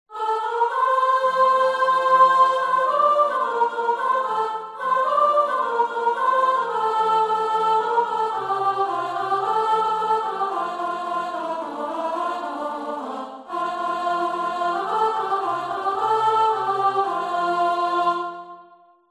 Chorus Sop

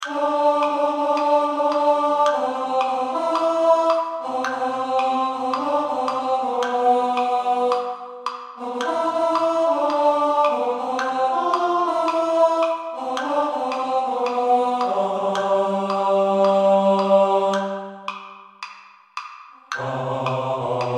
Men Tenor

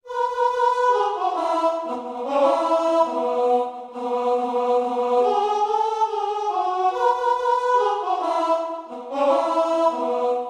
Verse Tune

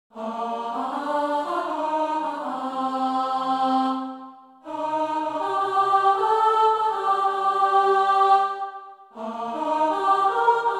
Alto

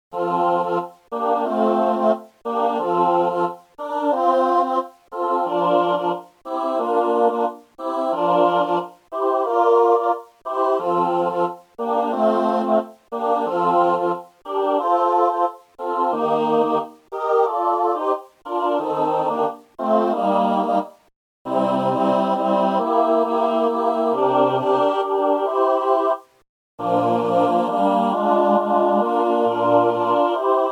Dancing Bass

